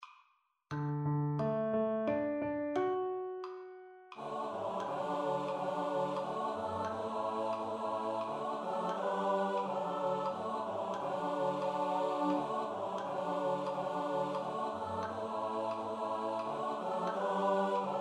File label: SATB Study